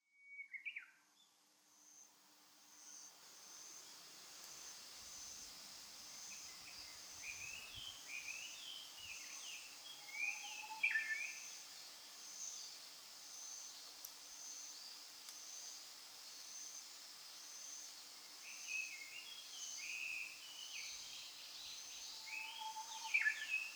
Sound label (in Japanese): うぐいす, 東京都三鷹市; 「国際基督教大学」周辺でのうぐいすとシジュウカラです。; 2025年6月下旬に東京都三鷹市の「国際基督教大学」周辺でのうぐいすとシジュウカラです。; 野鳥の声